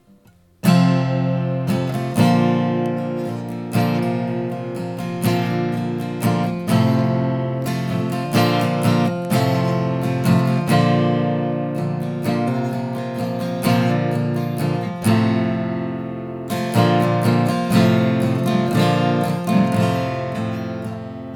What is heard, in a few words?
Dtuned Acoustic; API